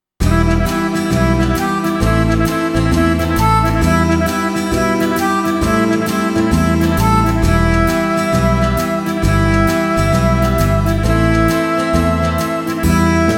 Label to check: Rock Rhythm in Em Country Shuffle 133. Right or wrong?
Right